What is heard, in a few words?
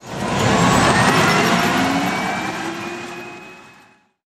Abrams engine acc start; DCS